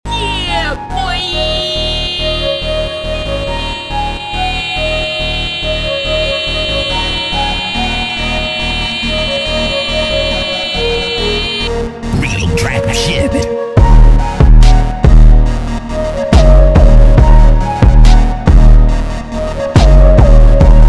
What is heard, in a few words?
constructing a beat